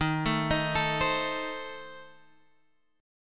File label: C Minor 6th D sharp, chord